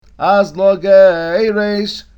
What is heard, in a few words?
azlah garash, cantilation